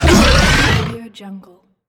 monsters growling

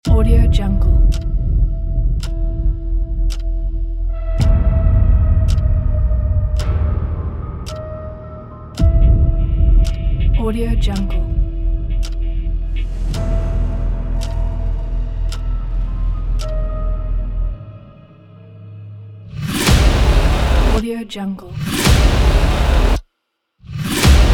dark horror trailer; synergymusicua

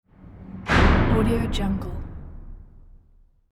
metal dungeon doors closes

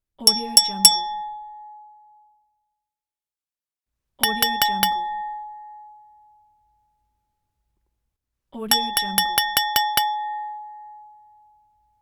glass toast

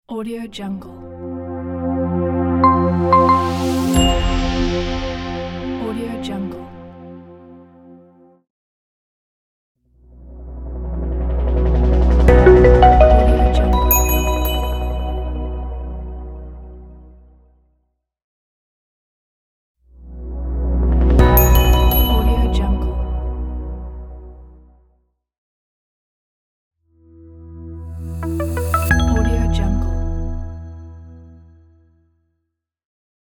flat logo pack

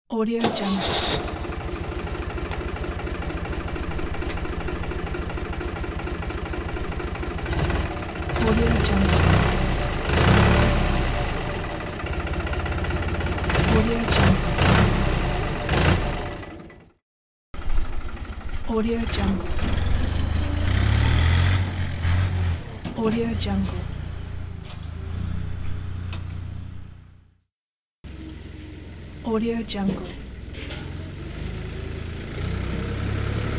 farm tractor

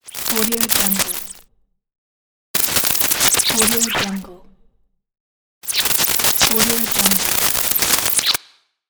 glitch sparkle

